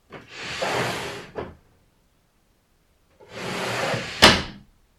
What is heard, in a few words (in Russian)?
yashik otkrit zakrit